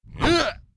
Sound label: guard attack; propack